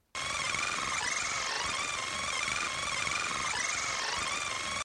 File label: Torture Msg Tone